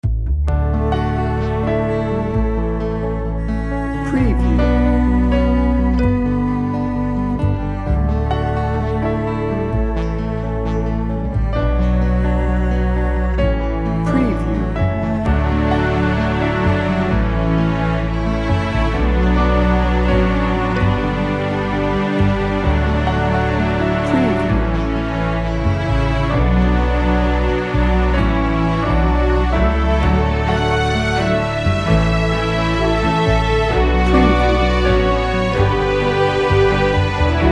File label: hopeful emotional cinematic